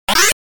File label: Drawing a Sword